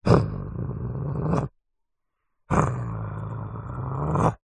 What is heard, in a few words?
cartoon dog growls